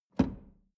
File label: drawer medium close lock hard